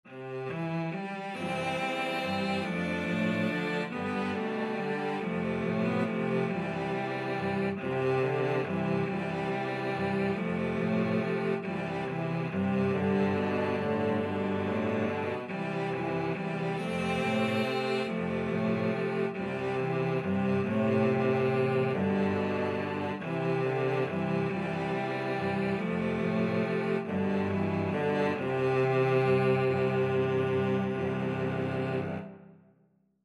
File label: cello quartet